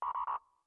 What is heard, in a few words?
Capacitor Warnin